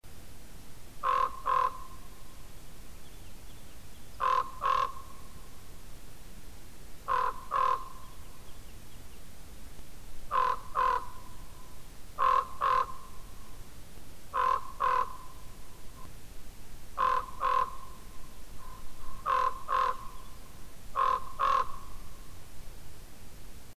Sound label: Common Raven, Seal River